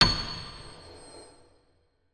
notes, keyboard piano; Hideout Furniture

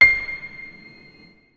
notes, keyboard piano; Hideout Furniture

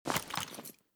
mp5 holster, weapons; Mask's Reanimated Anomaly Arsenal

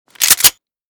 mossberg unjam; weapons; Mask's Reanimated Anomaly Arsenal

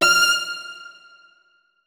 notes, keyboard; Hideout Furniture

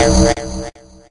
gravity entrance, anomaly; Soundscape Overhaul

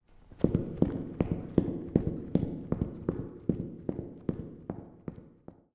ambient soundscape underground; Soundscape Overhaul

